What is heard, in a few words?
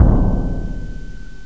timpani; GMPlay; Strings & Orchestra